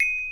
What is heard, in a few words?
crystal chime; everness